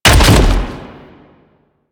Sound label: Land; Warframe; Sentients; Erra; Foley